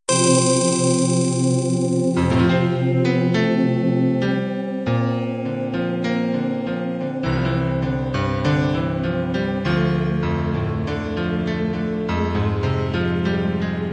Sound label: country-pop ballad end